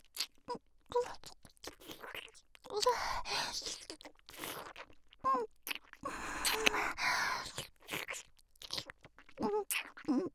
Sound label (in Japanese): ディープキス(激しく)